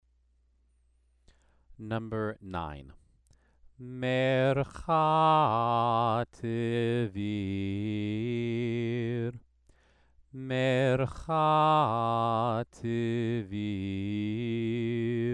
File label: Torah trope exercise